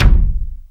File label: DEEP TAIKO; Back In Time Records; Big Bang; THE WORLD